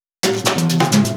Fill 128 BPM; Vengeance Samples; VEH1 Loops

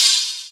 Ride, Cymbals; Vengeance Samples

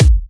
Kicks; Vengeance Samples

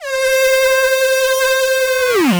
Roland D Line, Roland S100; musicradar-synth-samples

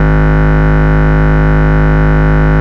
Bass, Root G#; Vengeance Samples

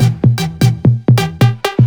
Melo 128BPM; Mini Kits

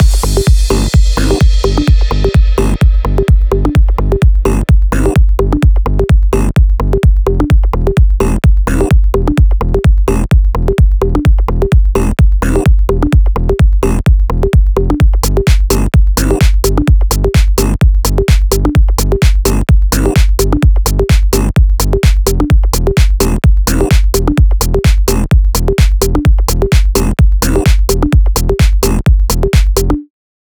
128BPM Averna Mix, TechHouse; Vengeance Samples; Bonus TechHouse Kits

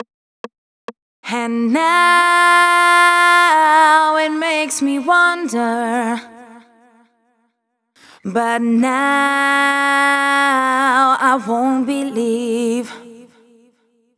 Bridge FX, 136 BPM; Vengeance Samples; Breakaway

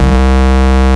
Ultimate Bass Root C; Vengeance Samples

